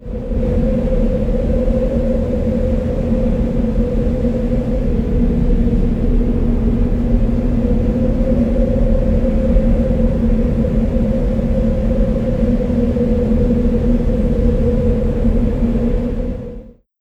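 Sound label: Ahaggar Wind left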